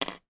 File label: household metal hair clip put down on table